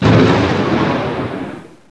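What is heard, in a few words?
XPLOSN, surface explosion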